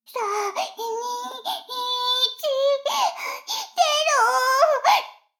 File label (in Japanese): シコシコ音声; 逆時間停止されて